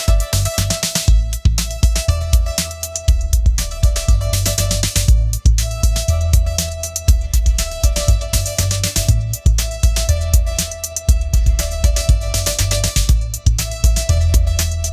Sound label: pop anthem; ai-generated